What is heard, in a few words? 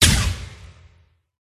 cat attack contact bottom